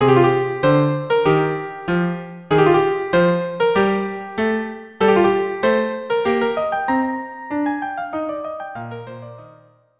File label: March of Smugglers violin cello; carmen